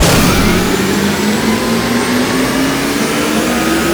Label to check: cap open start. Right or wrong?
Right